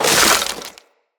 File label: Melee Impact Wood